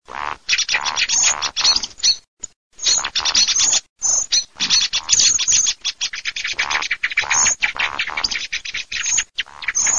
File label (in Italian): BECCACCIA